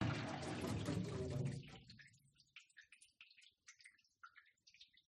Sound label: washer washing machine water flow stop; pmsfx